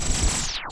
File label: reels end